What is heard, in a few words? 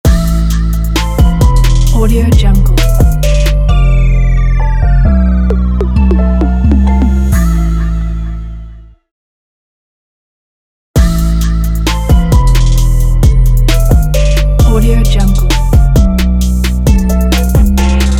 dark piano trap logo